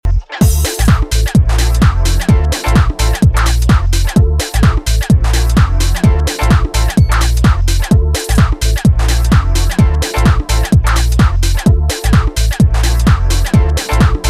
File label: Boink Contrast Stitch